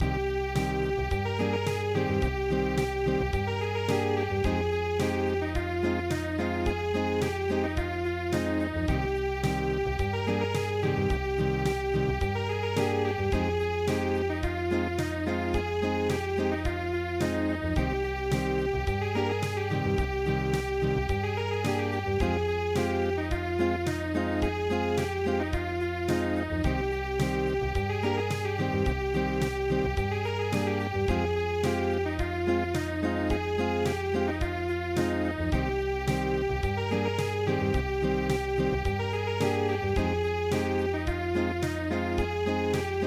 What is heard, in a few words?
Unplugged; Tin tin tinimini hanim